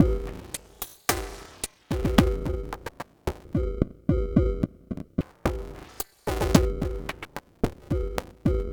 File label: drums lofi; Nurykabe